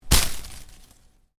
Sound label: Foliage Impact, Hard Foliage Hit; Impacts; Miscellaneous Impact